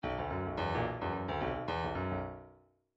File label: midi grooves funk